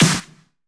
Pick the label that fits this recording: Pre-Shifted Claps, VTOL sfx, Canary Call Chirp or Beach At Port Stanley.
Pre-Shifted Claps